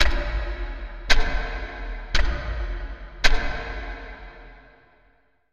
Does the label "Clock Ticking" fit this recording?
Yes